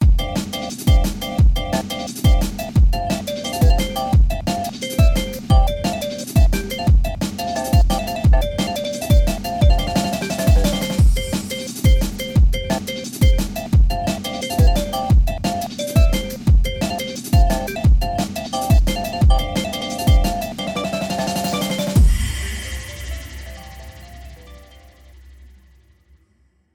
Oppo A96 ringtone; [China]

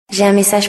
French sms ringtone; French Sms (1)

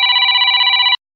Samsung S21 80's Phone Ringtone

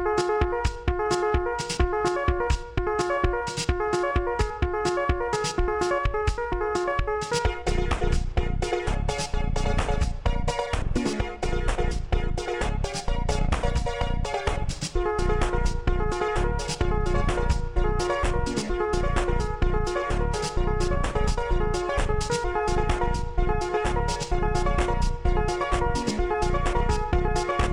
Ringtone; Vivo S16

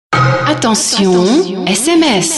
French sms ringtone; French Sms (2)

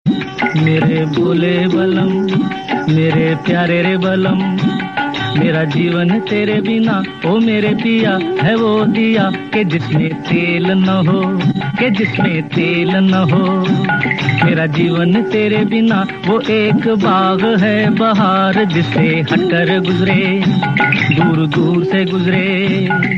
Bollywood Trendy Old Ringtone

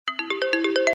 Galaxy A70 Samung Ringtone

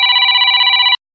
Samsung s21 80's phone sms

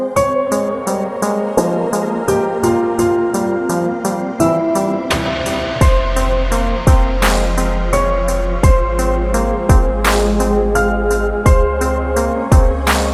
Oppo Reno 8 Pro Ringtone; (China)

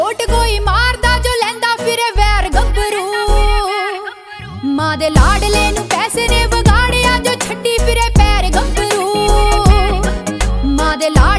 Ringtone Punjabi

